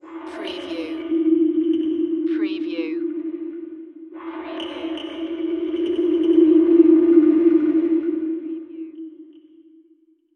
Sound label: alien spiritual; sound effects bible